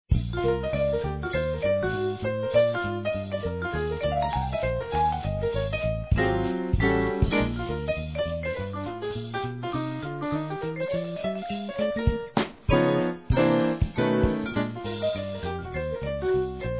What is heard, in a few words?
blues